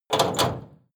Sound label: lock metal door